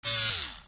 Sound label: Clipper Unequip; Criminality